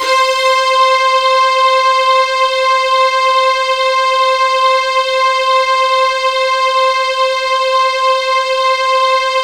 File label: C5, synth; 90 s Sample CDs; NiceBeats; SynthLine VOL-27